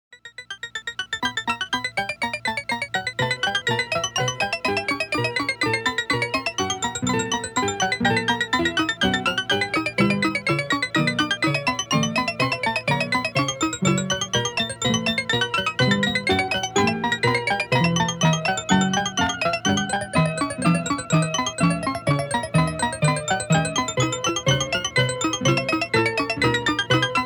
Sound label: Fugue